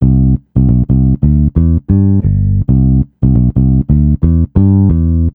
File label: Swing; Бас сэмплы (Легкая музыка)